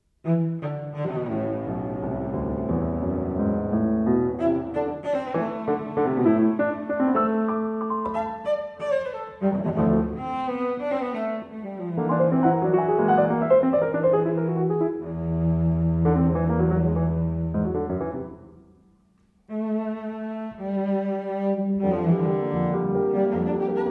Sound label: Sonata for Helen for Cello and Piano II. Scherz